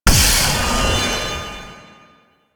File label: Wave Ability Charge Up; Enemies; Abilities; Warframe; Duviri; Dax Knight